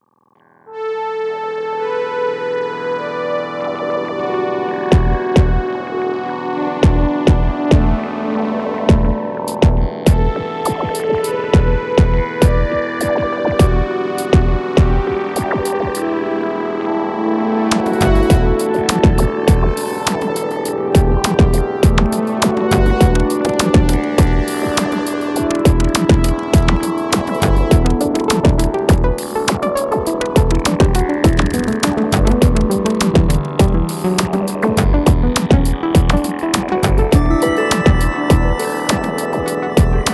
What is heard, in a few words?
Prophet 08